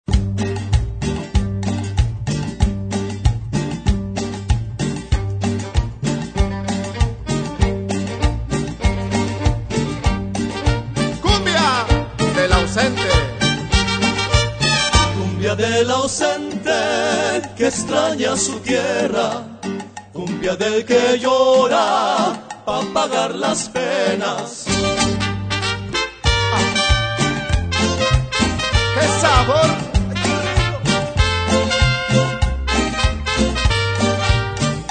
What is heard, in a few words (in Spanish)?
cumbia